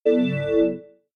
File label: incorrect